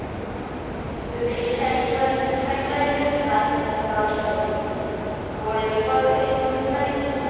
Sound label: Main Railway Station With Indisce